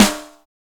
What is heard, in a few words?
LOOSE SNARE, drums